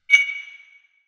UI invalid sound

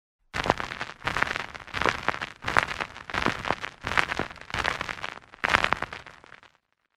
gravel road

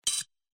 computer error sound

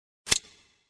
unlock

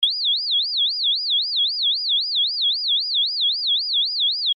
beep

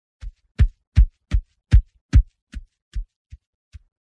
footsteps Dart texture

